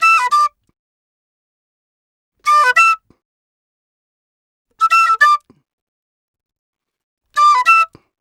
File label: Penny 98 bpm G, Penny Whistle, African; Quazibeat; African Secret